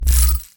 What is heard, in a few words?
pause continue click; o!mania Skadi Arrows